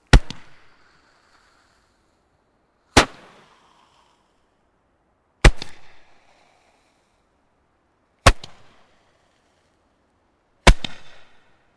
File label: FIREWORKS FIREBALL SHOOTER 5 SHOTS; Domestic Fireworks; Fireworks (Sound Effects:Backgrounds)